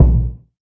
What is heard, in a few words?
classic hh kick; STYLE KITS (Hip Hop, Etc); Classic Hip Hop Mega Kit